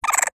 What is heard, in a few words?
cartoon laugh high pitched crazy bonkers mouse